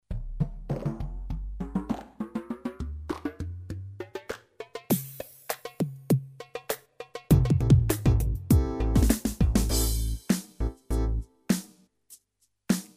tr hiphop, ritm

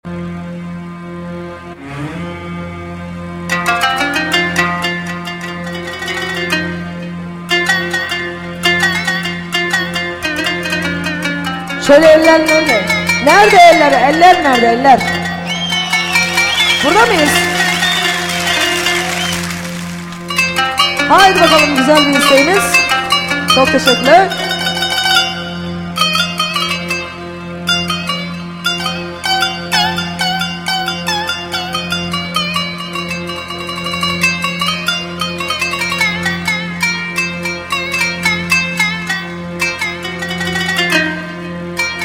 pa1x kanun

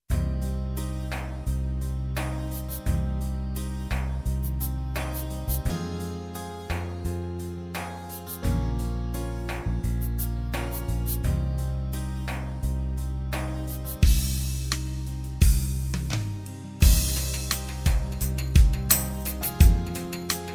slow, roland gw8; roland gw8 rtm

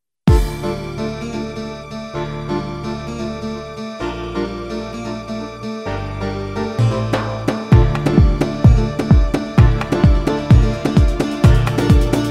psr a1000 tr

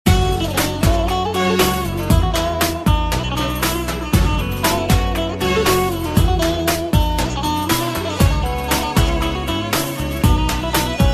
turkish saz

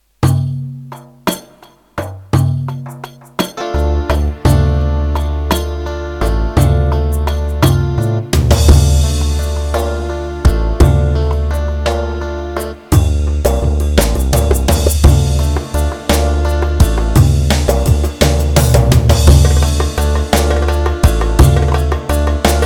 6 8 slow; roland gw-8 ritim

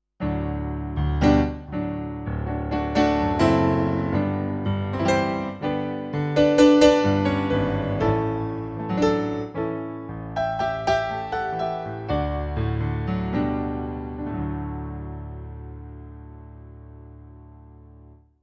yamaha tyros5 pop grand

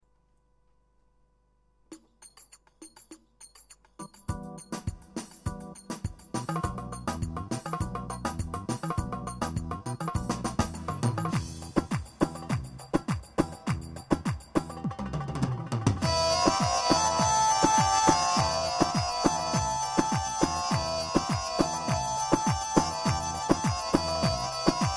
korg pa 55 kemence, kemence tulum demo; zafer

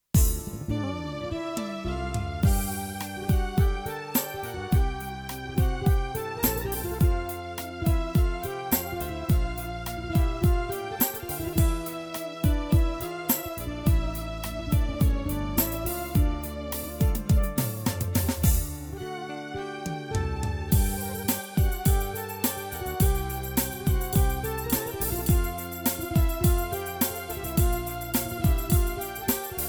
8beat

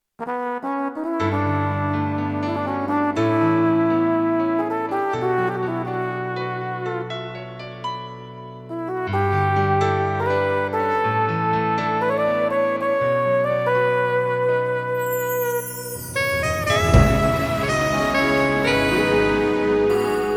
flugel and sax, korg pa500; tr solo enstrumanlar

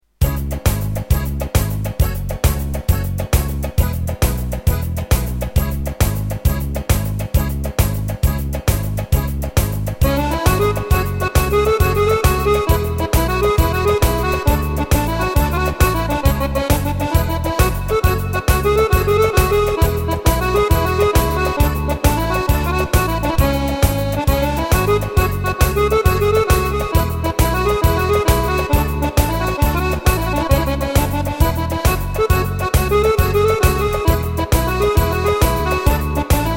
azeri s650; yamaha; 6 8

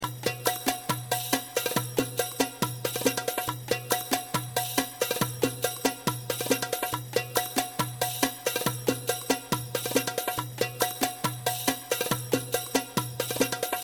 tyros2 balady; balady style

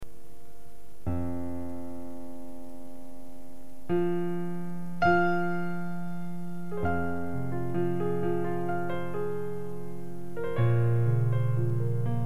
pa 600 piano solo

